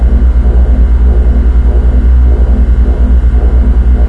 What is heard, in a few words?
big generator loop; video game; Left 4 Dead; Source Engine; ambient